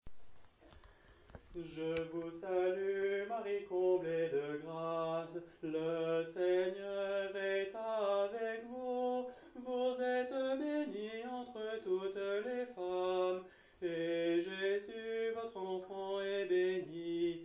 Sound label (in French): Voix de soprane homme; je vous salue Marie